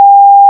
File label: conference tone